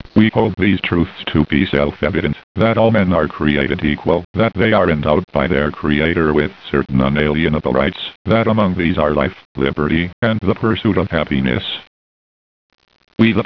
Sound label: heart